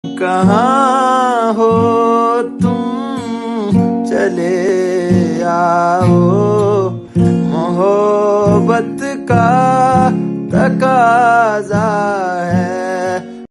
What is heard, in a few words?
sad hindi